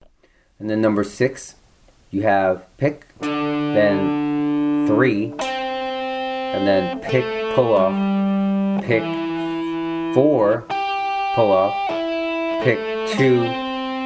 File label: hybrid picking exercise, guitar